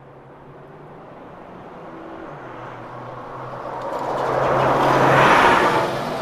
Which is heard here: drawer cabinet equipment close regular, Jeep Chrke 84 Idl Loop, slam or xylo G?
Jeep Chrke 84 Idl Loop